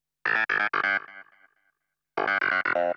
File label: SYNTH HOUSE 125 A; eJay; Keys